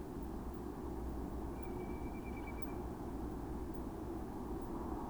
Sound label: Whimbrel